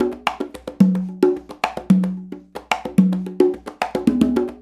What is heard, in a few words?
loop, congas; Percussion; InVision Interactive; Percussion Slam